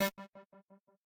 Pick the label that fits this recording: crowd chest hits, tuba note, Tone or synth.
synth